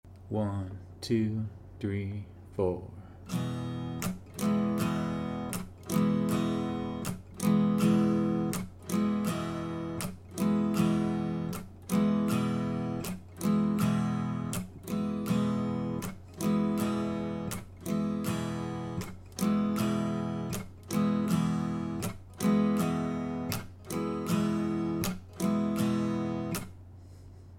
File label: strum